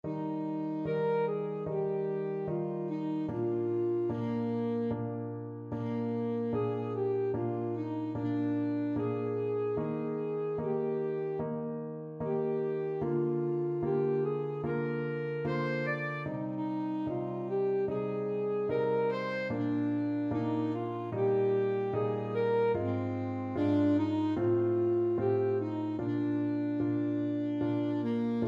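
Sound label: alto saxophone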